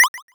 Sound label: Retro Water Drop